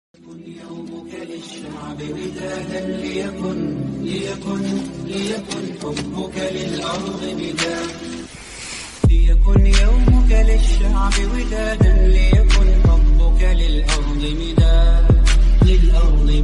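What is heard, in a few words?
Arabic ringtone, islamic ringtones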